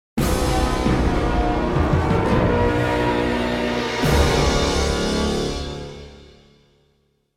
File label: Fanfare; Godzilla Save the Earth